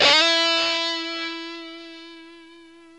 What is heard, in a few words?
GUITAR, POWER GT